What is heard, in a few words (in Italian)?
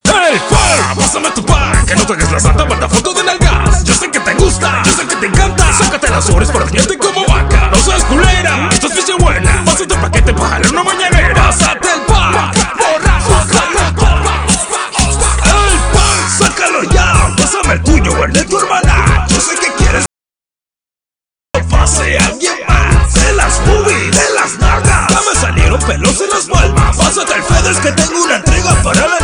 musica; cstrike; shroomforest